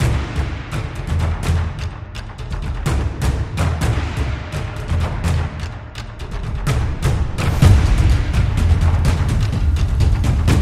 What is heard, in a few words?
Japanese Drum